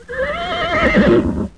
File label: Horse neighing distance